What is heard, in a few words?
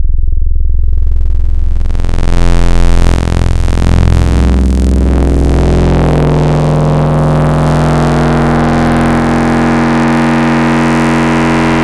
Mini MB Saw Mod, Xfer Serum Wavetables; Synthetic's Serum Collection; Cymatics - Nuclear for Xfer Serum Wavetables